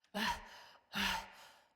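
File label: Cry Heavy Walk Female; Battle Barks; Envoy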